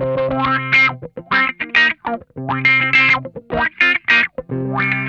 GRAINY WAH, Funk Guitar; Zero G - Funk Guitar

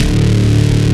STICK; E-MU Formula 4000 Series Vol. 3 – Analog Odyssey; VK+ Instruments

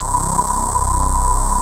VOX PULSER, DANCE; Pro-Rec - Synthsonic; Z1 DANCE

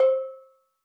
Steel Drums Layer C5, Calypso Steel Drum; Percussion

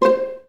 VA PIZZ C4, Orchestra; Best Service; Peter Siedlaczek's Advanced Orchestra

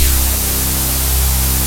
TECHNA STABS, TECHNO; Pro-Rec - Synthsonic; Z1 TECHNO

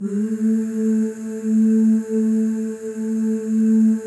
OOSC, FEM OOS, Voices; Symphony of Voices; Spectrasonics; FEM.2 OOS

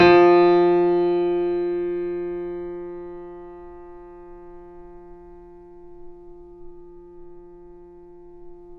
Mf F 2 off, Grand Piano off mic; AKAI S6000 CD-ROM - Volume 2

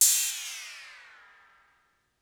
Cymbal Squash; Lost Tapes From Mars

